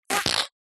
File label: cartoon fart airy squench